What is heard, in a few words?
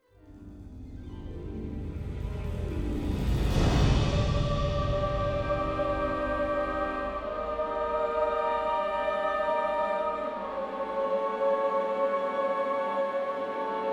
forest cursed music